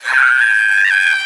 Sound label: Scream, G5; 音MAD; 孤独摇滚